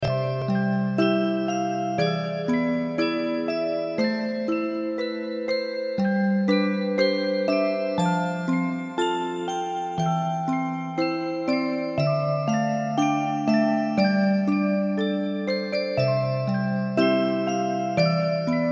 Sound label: Lullaby Loop